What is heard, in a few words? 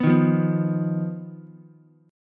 Eb Suspended 2nd Sharp 5th, Ukulele; strummed; chord; 4,3,1,2; JGuitar